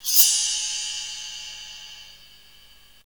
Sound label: MIXD PERCUSSN